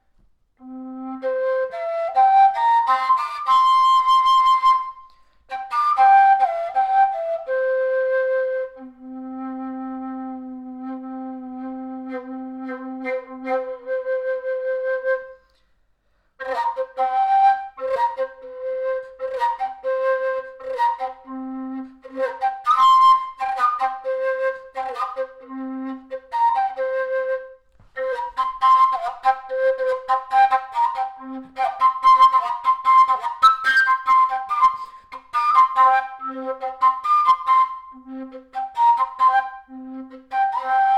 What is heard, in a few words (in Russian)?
kalyuka